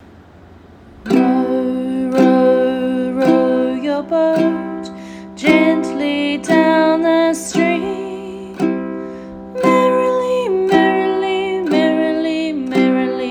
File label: Uke row your boat; Uke book